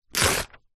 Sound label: book large thick pages several rip out simultaneously; household